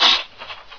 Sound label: paper